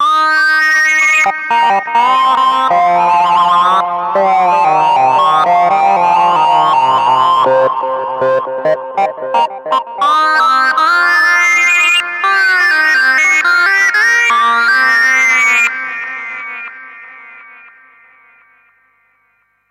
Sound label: Sync Lead, Marshall Echohead EH1